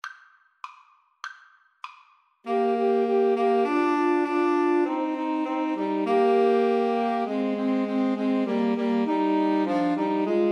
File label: SAX, Woodwind Trio; old st nic